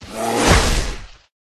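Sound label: WhiteBear A bottom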